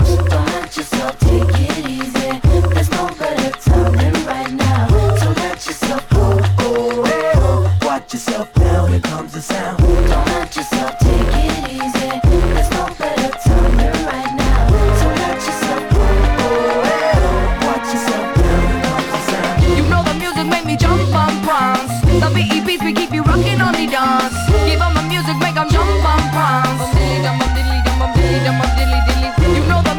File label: Stanton T.92 USB Turntable Normalized